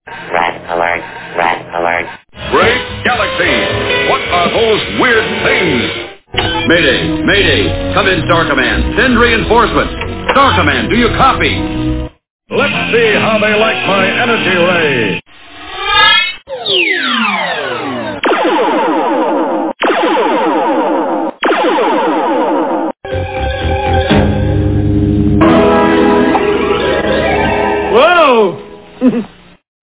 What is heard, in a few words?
red alert; alien